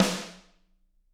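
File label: snare drum; Bob Clearmountain Drums I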